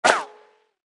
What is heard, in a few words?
ricochet